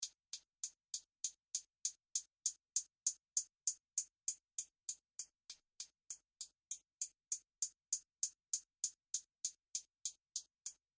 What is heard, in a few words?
HiHat